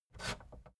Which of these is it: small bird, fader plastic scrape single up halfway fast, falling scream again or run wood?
fader plastic scrape single up halfway fast